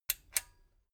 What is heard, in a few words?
metal button stereo click disable slow